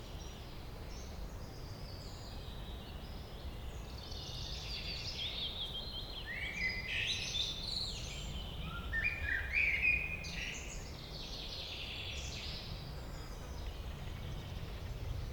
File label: Summer Forest